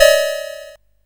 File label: sid CSG8580R5, GAMES; Cavelon